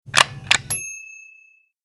gun cock

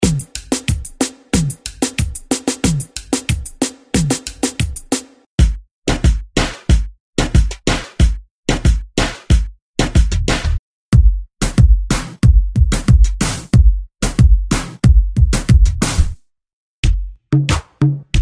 Reggaeton

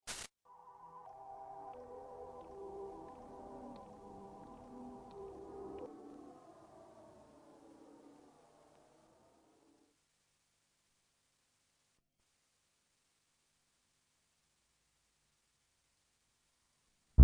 deep space drift

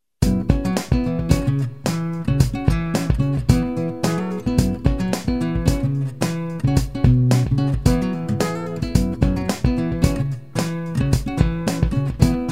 funk

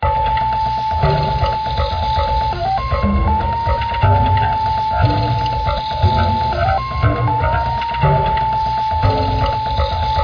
Industrial Groove

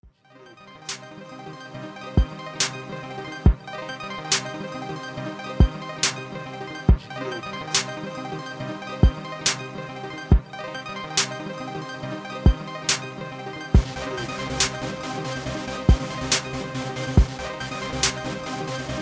nexus arp

